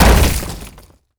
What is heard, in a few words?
Stone Down; kids park kaitou sentai lupinranger vs keisatsu sentai patoranger